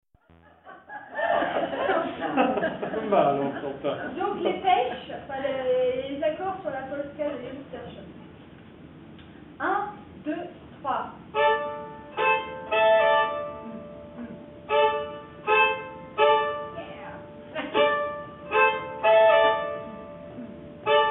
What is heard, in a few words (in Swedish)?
Polska, nyckelharpa; Polska Jons Persson